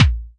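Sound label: Pearl SC-40 bass drum; DRUM MACHINES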